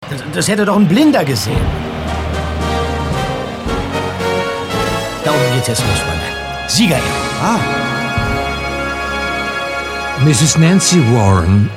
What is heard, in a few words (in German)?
Tusch